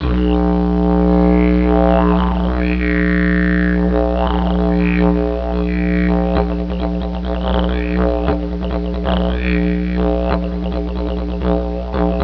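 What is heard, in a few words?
didgeridoo; didj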